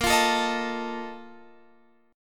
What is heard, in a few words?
A sharp Major 7th Suspended 4th, down, Guitar, Open F; strummed; chordshape